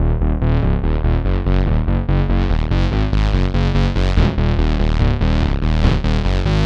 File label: Bass Loop